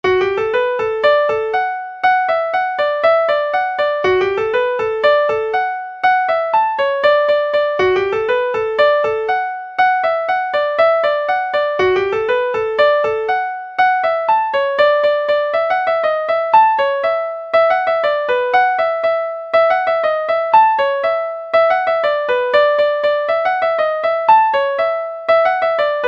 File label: country dance polka